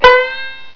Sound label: Banjo Bend; Instruments